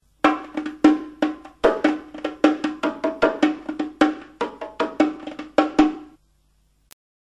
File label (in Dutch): Vel bongos